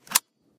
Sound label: buckle